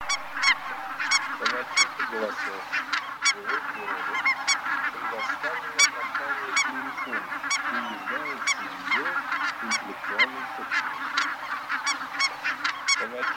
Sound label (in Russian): Гусь Росса Oche Di Ross; plurifon